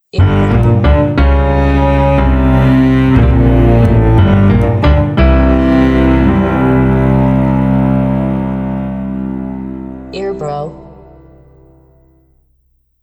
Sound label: Detective Story